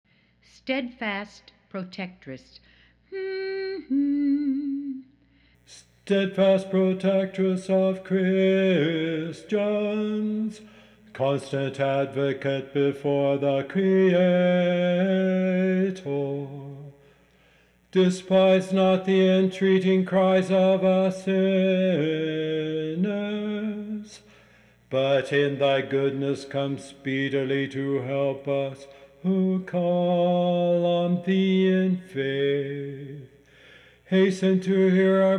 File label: bass, choir; kontakion steadfast protection